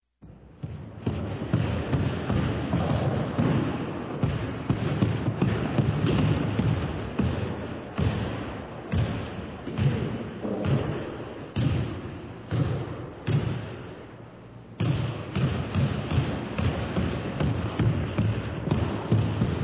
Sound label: Dribbling Basketball